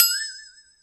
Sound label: Metal, Percussive FX; musicradar; cartoon caper